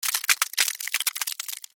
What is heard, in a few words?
Weather Ice Crack; Snow